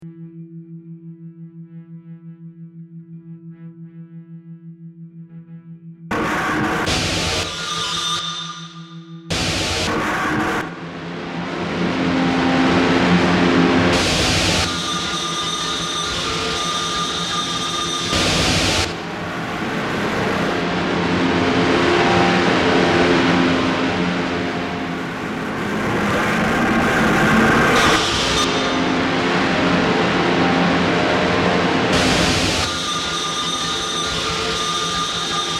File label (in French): applau; Les applaudissements ne se mangent pas (2002)